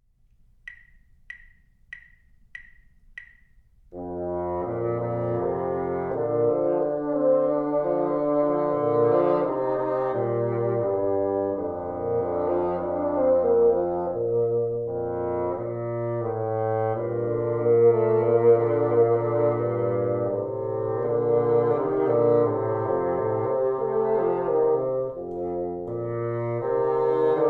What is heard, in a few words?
together, bassoon